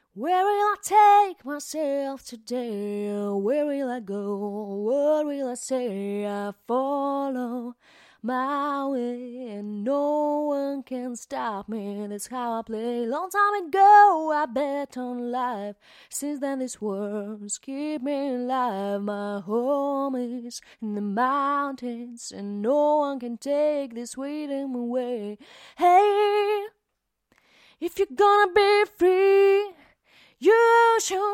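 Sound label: Pop Rock, 75, G minor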